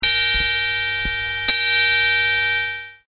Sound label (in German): triangel